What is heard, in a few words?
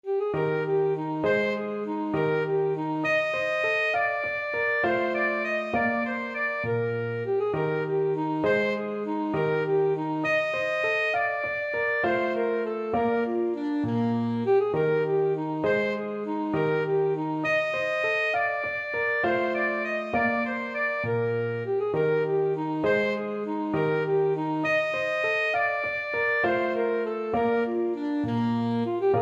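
Gigue G Major, alto saxophone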